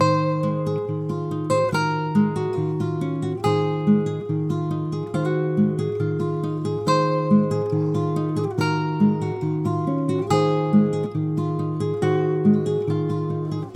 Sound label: Nylon Guitar